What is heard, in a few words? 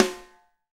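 SD, snare drum; Real-Kit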